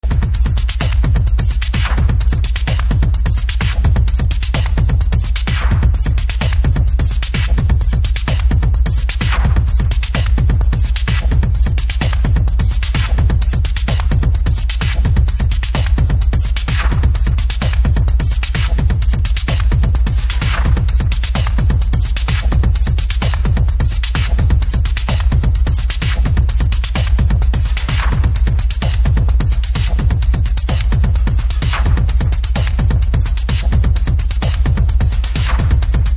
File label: synchro rhythm (perc remix)